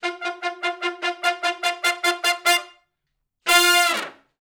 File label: Shuffle Blues Triplet Riff (F) unison, Horncraft for R&B; Sonic Foundry; Sony Creative Software; Sections